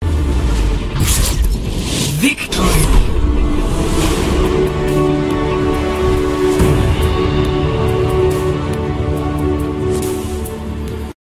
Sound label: overwatch victory; Memes